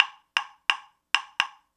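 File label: Hip Hop Clave, 125 bpm; Percussion; World; Digidesign; Pro Tools; Pro Tools Essential Loops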